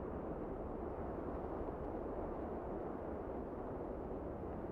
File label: dist wind; ambient; outdoors